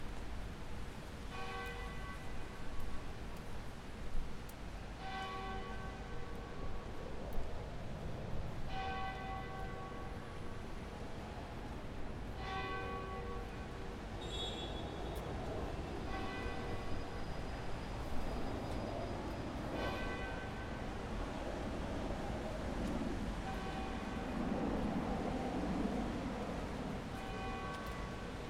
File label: London Westminster Abbey Bell; church bells; Religious Sounds